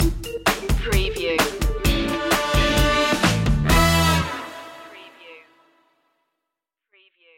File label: re rock funk stinger